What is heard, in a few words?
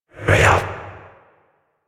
Possessed Red Veil Vocal Attack; Enemies; Warframe; Priest Quest